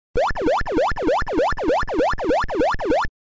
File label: snake On Ground; Frogger; Arcade